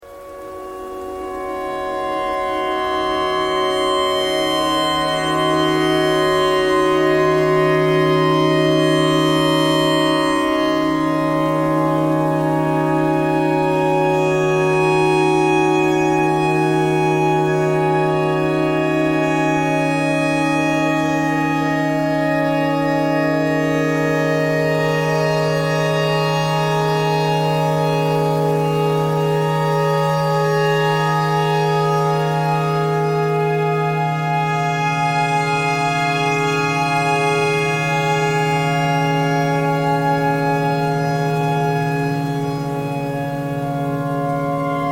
clarinet notes e tornado siren thread pentatonic

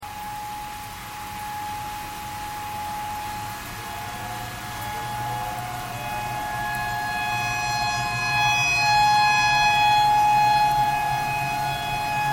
tornado siren tornado siren thread simple

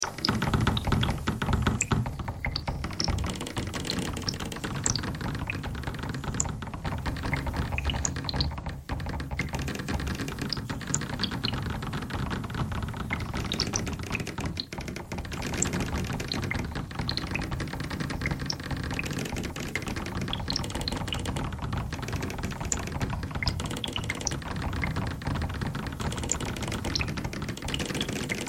bagpipe thread noise; bagpipeclick

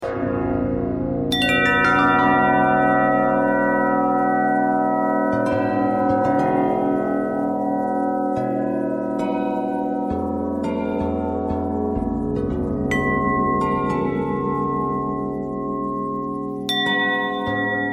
bell bell thread noise, bells

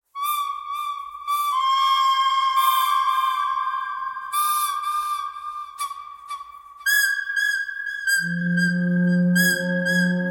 bird birdcanyon thread pentatonic; birds

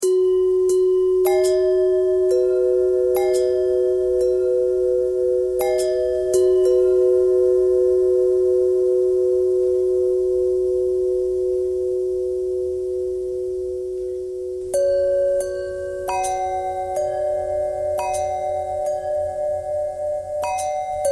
long bell bell bend threads; bells

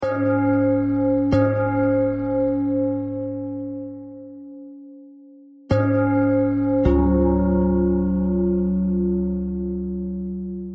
bell bell thread simple, bells

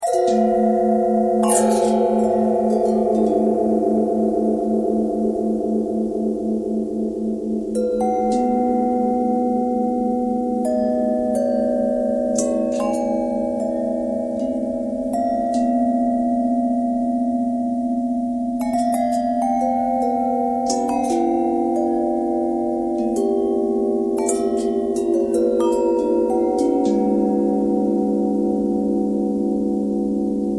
longbell bell bendtwist

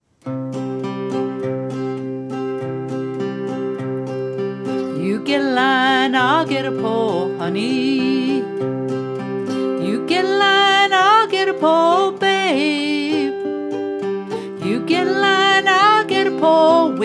crawdad song, female

